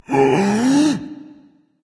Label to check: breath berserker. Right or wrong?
Right